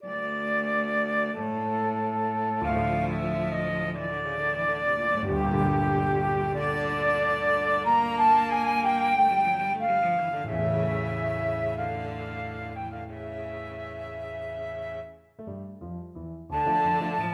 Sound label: Trio; für Flöte, Violoncello und Klavier (2004); Andante cantabile